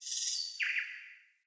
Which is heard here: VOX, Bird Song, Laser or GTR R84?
Bird Song